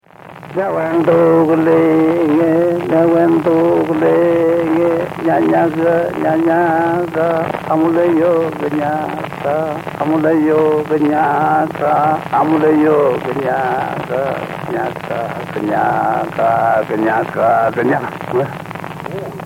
Canto de hombre; Música Mapuche

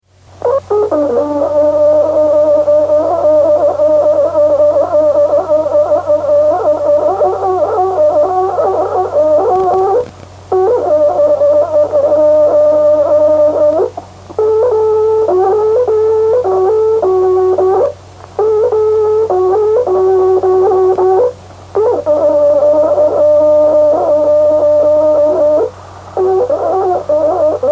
Toque de trutruka; Puede ser en Boroa, Collico o Dollinco; Música Mapuche

